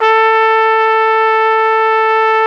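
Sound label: FLUGELHORN A; Best Service; Alpine Volksmusik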